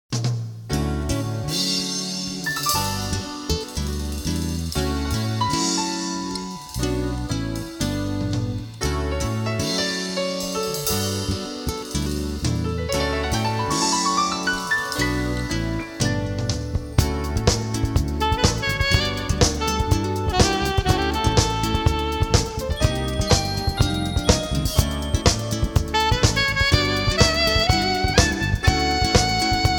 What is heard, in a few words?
light jazz